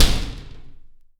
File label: SHOWER